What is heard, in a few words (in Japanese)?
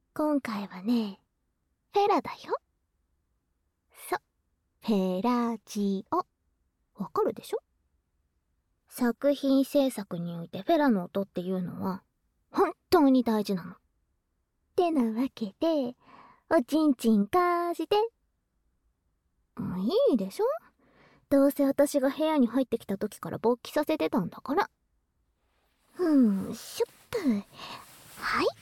フェラ音, ASMR